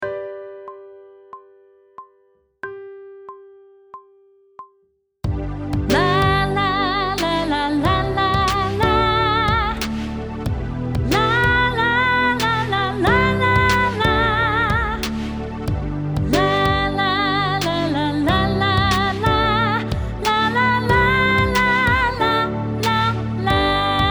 Sight Singing with vocal, pop vocals; Grade 4

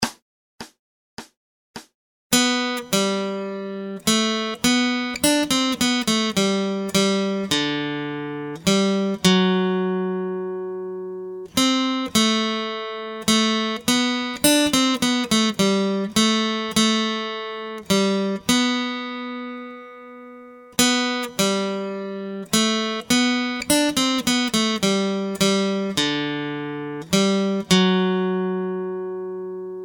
Acoustic Accompaniment, acoustic guitar

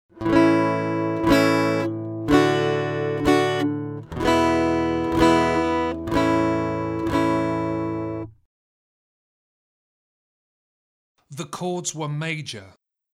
Aural Harmony Test, acoustic guitar; Grade 2

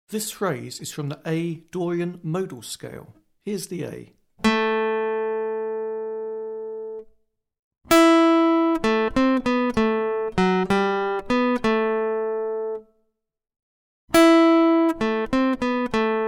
Aural Pitch Test, acoustic guitar; Grade 7

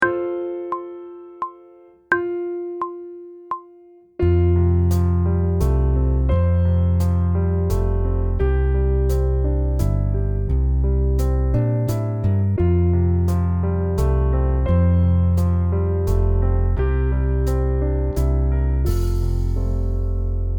Sight Singing backing track, pop vocals; Grade 2

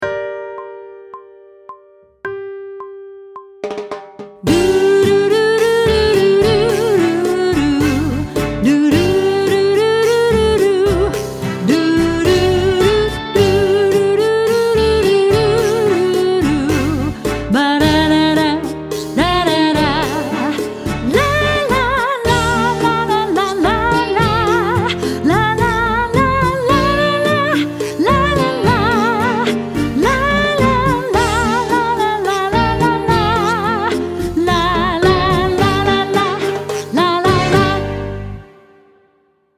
Sight Singing with vocal, pop vocals; grade 6

